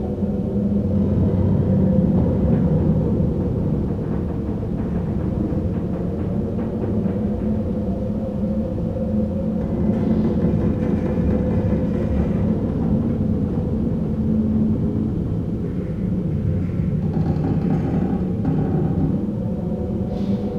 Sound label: Galleon Hallway Ambience; Warframe; Grineer Galleon